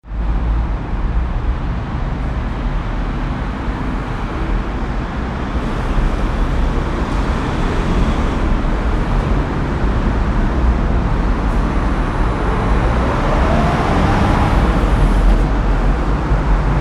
traffic

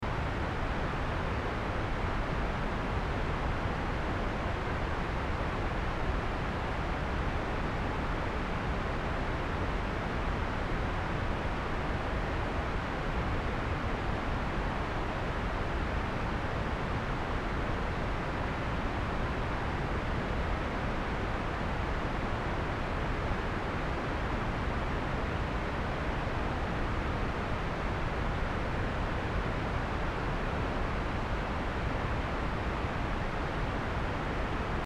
noise eq

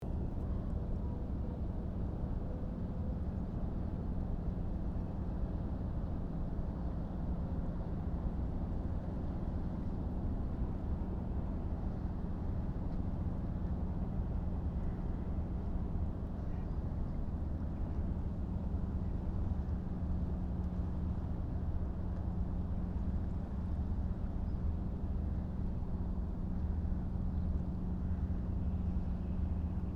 fishing port; Aratsu

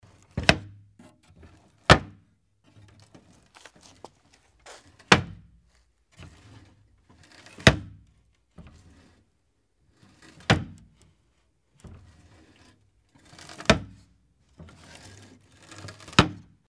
wood lid open close

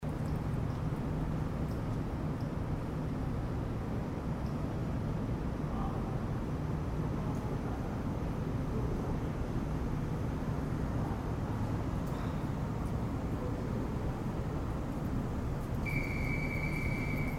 station; Ohashi

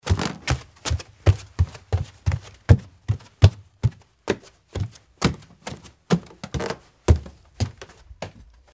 wood corridor

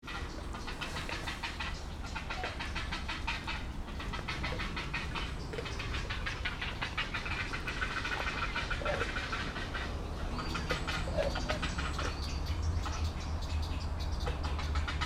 Shrine; Atago Shrine Sagi

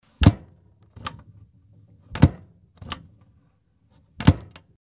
wood lid open close

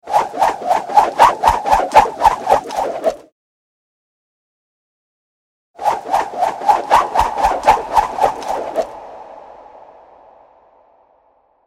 spin

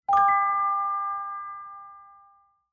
kiran

